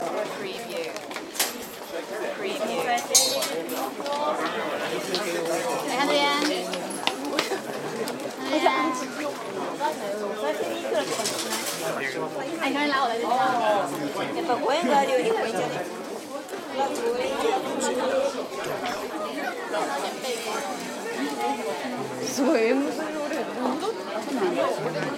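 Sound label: ambience crowd chatter clanks Tokyo Japan market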